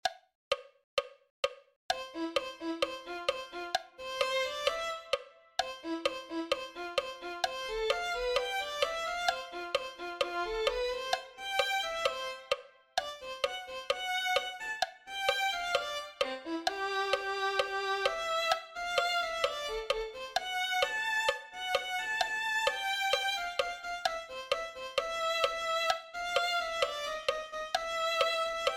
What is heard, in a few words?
violin